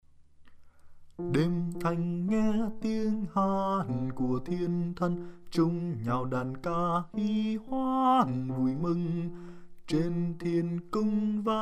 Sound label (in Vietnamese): Tieng Hat Thien Than Bass; Giang Sinh 2020